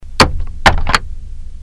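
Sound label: keyboard, office; machine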